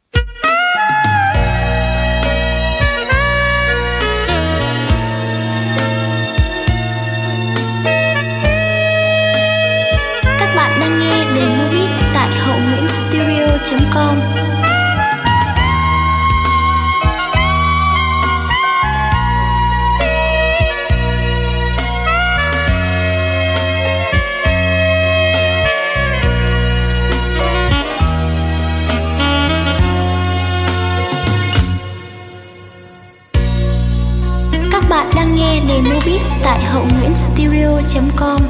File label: beat, playback